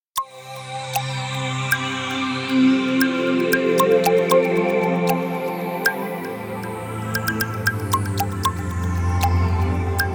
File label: Bamboo Outerspace, ringtones; Asus Zenfone-3-Deluxe